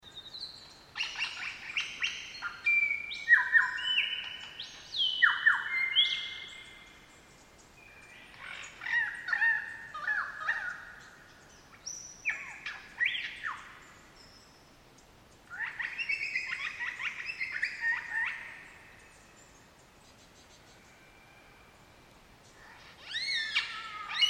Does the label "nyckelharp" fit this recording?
No